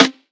snare; 7rystan's Snare Pack 2018

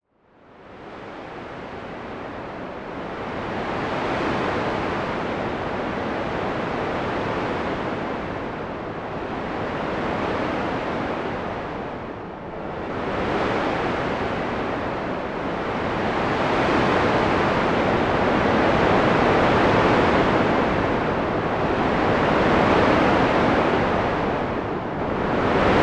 Ocean Waves; 7rystan's 700+ FREE COLOSSAL EDM SAMPLE PACK

